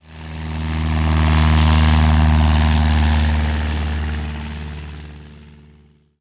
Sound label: cessna flies overhead